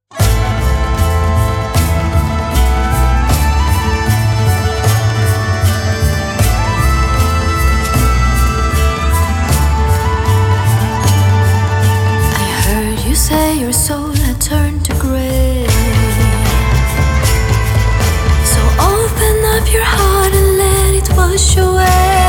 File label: (acoustic version)